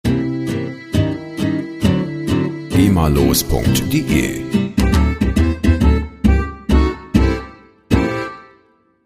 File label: jazz tusch